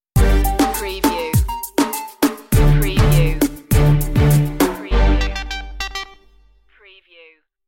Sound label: tiempo de sol stinger